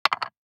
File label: DICE on Wooden Tray, Throw and Roll, Metal, 1 One Die; Samples; ArticulatedSounds; DICE Sfx Party (Extended)